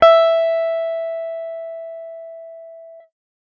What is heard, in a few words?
guitar electronic, clean electric guitar